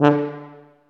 TUBA, Tuba stacc; Roland L-CDX-03

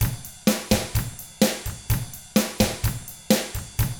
127 SURF ROCK

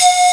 PANPIPE C4; AKAI S6000 CD-ROM - Volume 1; SAMPLE WAVE MIXING 1; SYN PAD #1

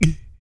BASS BOMB; ILIO; Vocal Planet; Blues & Soul

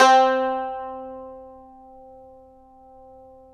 tar; Roland - LA Composer vol. 3

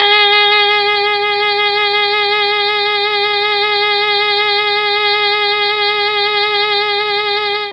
G#3 MKII SAX, Mellotron; Mike Pinder - Mellotron Archive CD

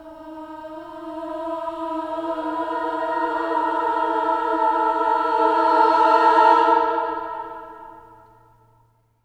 CLUSTER, Voices

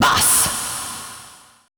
FX VOCAL

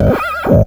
Horse laughs, Percussion Hits; Zero-G; Soundclash; Acidized Wavs

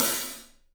PAISTE HAT, Drums; 3D Sound

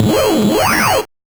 Noise, 8-bit, Bitty Noises

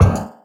Spitter Snare, Esoterik Beatz; Spitter Kit

